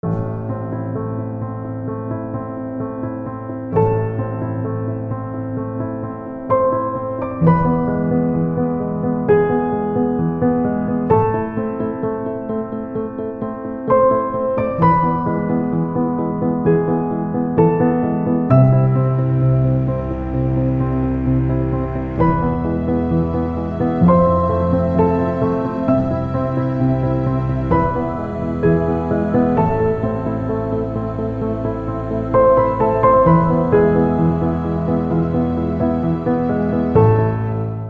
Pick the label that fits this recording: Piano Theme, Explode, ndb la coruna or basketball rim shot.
Piano Theme